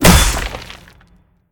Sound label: pwr hit stun object; smite; gilgamesh